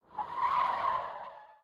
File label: police skid side; Blind Drive